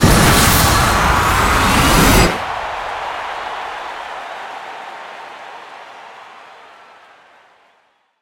hit and ramp up; netent

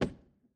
reel bounce; netent; piratefromtheeast

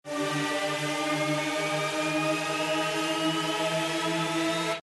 respin snd; netent; jackhammer

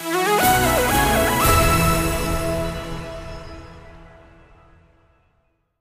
large Win; netent; starburst

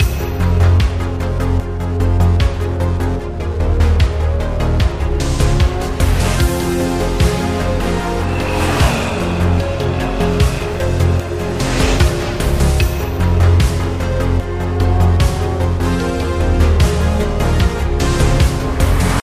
free Spin Intro; netent; multipliermayhem

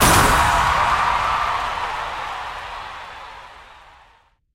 small Win; netent

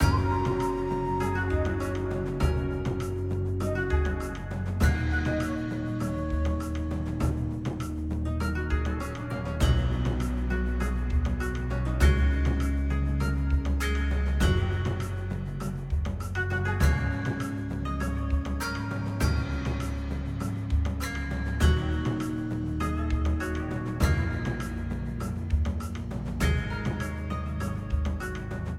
ambience General; netent; longpao

